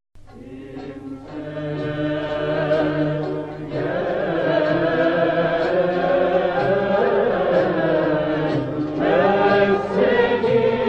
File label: Turkish Muwashah, maqam bayati; a Muwashah from the Turkish musical heritage